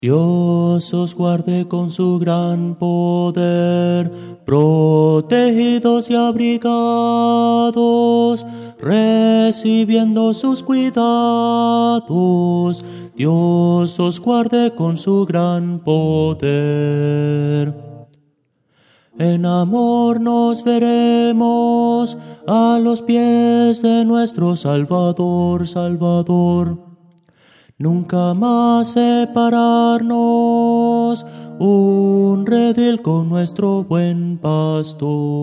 tenor

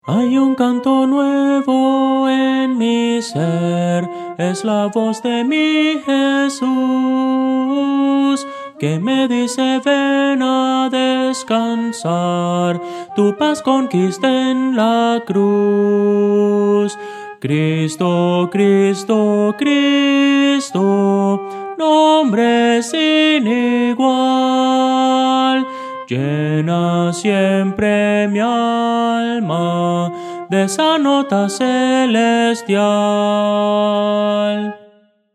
soprano; Voces para coro

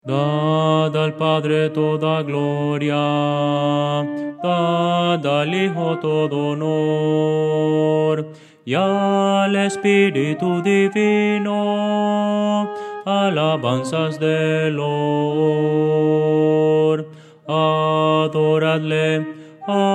contralto

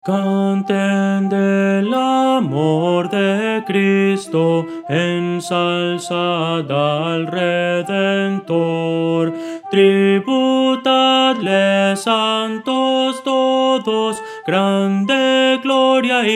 soprano

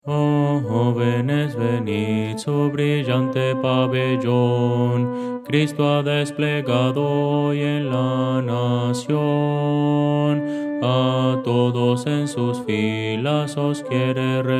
contralto; oh jovenes venid

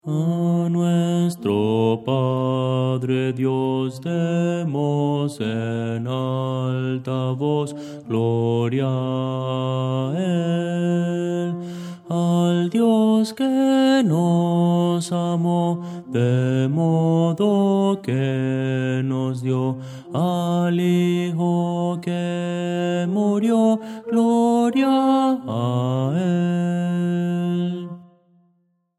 bajo; a nuestro padre dios

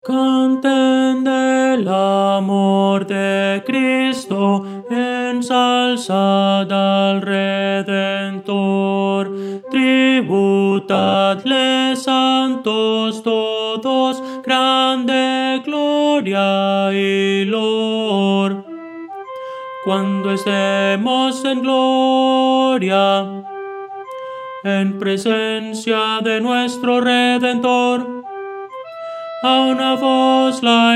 tenor

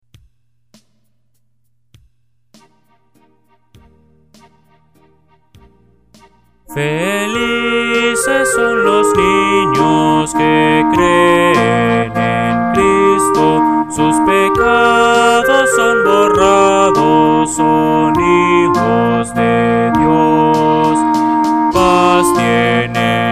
Felices son los niños, Himnos Cristianos

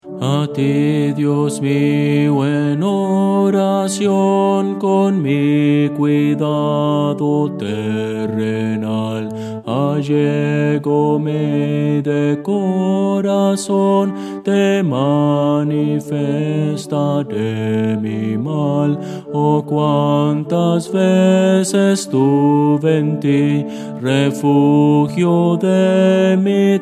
bajo

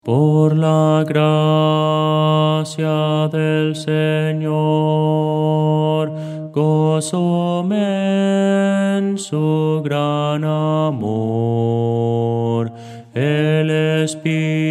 bajo, himnos cristianos

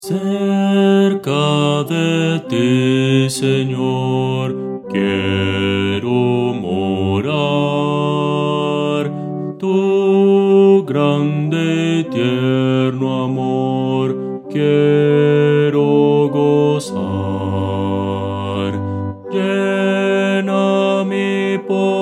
bajo

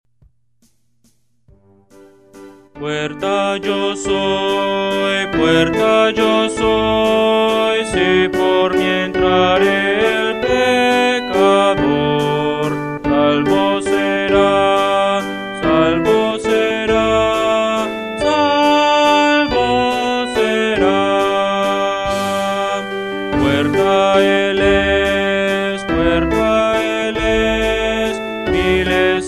puerta yo soy puerta yo soy, Himnos Cristianos

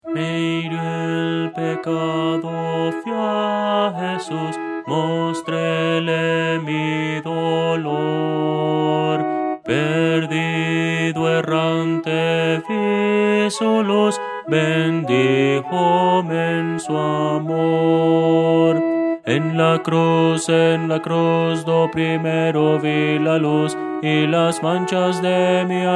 contralto

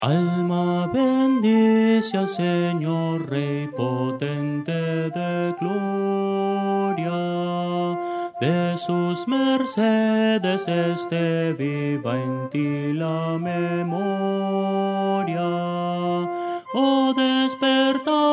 melodia; Voces para coro; Soprano

